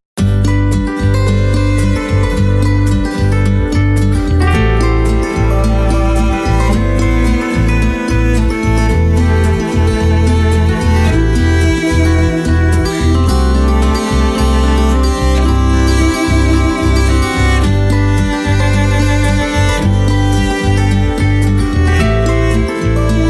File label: cello flower